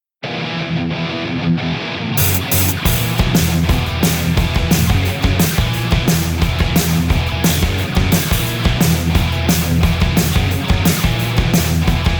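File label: Guitar Riff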